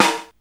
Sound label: RX5 RIM; Hollywood Edge; The Big Bad Beatmachine